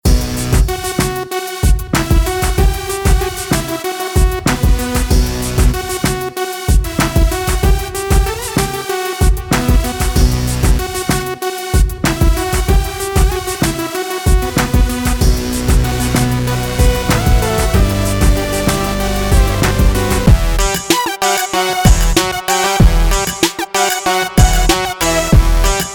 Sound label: HIP HOP; HIT CHARTS HIP HOP EDITION